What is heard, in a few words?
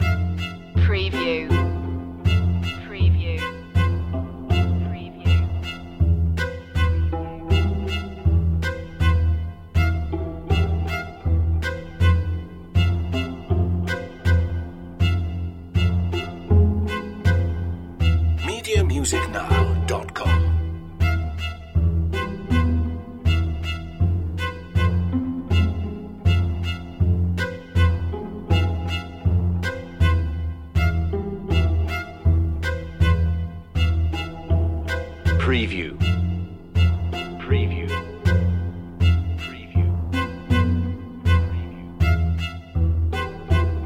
creepy strings loop